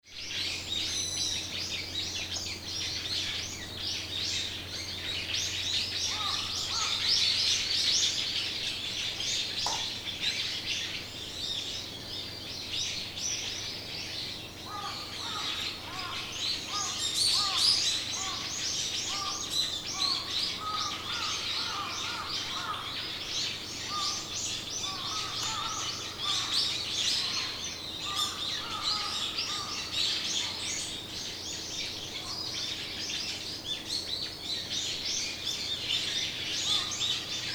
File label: bird